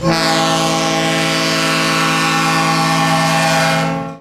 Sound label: Westinghouse E2 B1 horn; fnaf d-day e-44